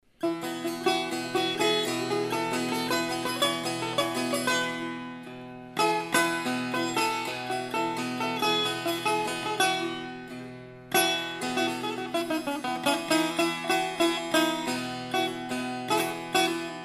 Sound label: persian setar, medium size bowl